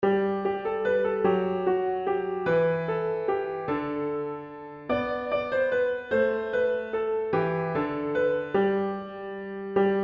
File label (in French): Menuet